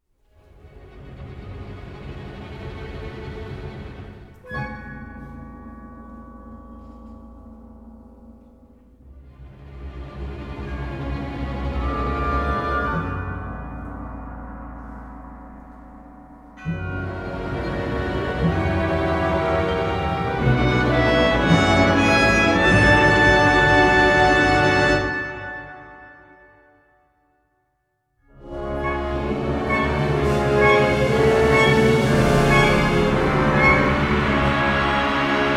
Tremolo Suspense

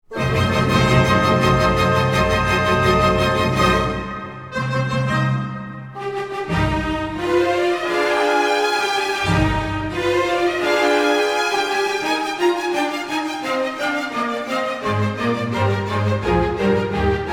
Orchestra Wipeout; Beethoven

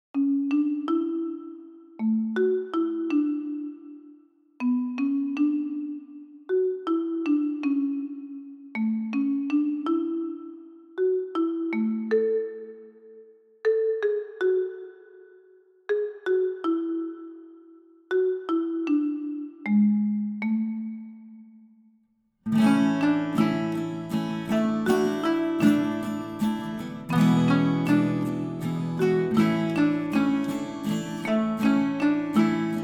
Marimba Guitar Lullaby

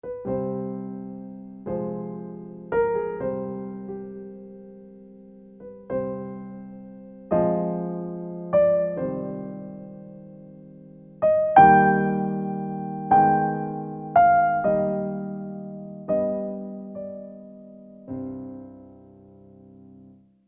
Brief But Sad Piano

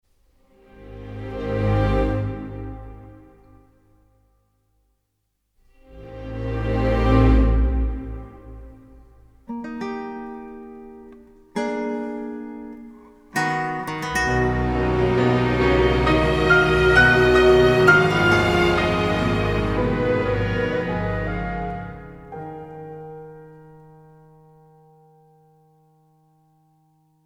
Deep Realization

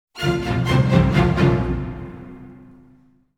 Quick Orchestra Motif

